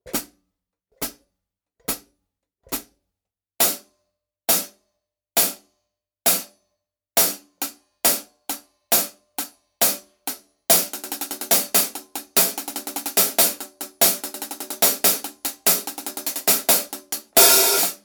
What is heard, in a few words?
troy hihat 14, platos; troy set masterwork